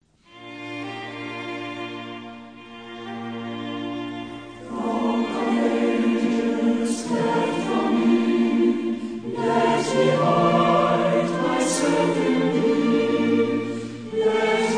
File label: Orchestra and Choir